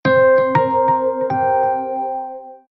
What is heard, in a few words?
Notif Soun; Appy Geek